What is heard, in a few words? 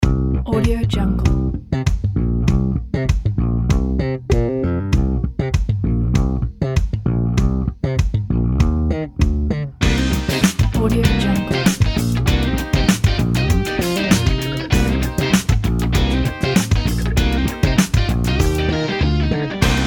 groovy funk; csrproductions